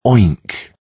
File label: oink